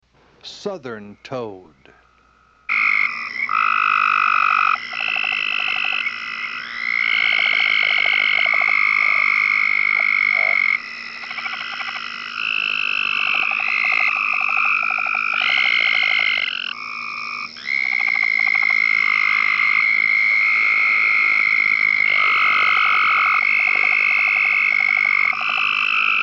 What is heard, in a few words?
Southern toad (Bufo terrestris); Family Bufonidae - Toads